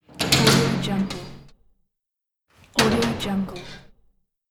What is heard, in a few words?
iron door open